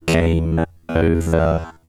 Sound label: Game Over